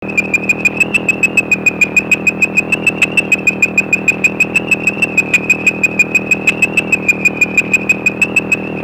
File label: Colostethus latinasus, Dart Frog